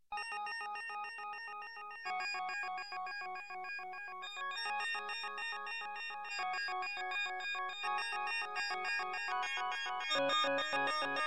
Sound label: Space Mystery Tune, Electronic, Music; Sound Ideas 2000 Series